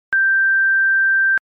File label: Sine 1570